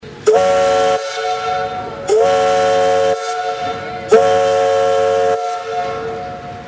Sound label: whistle, schaufelraddampfer; herrsching